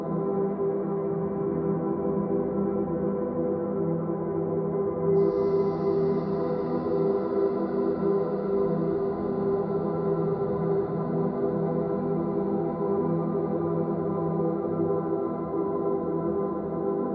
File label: pause loop; osu